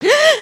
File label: surprised; emotes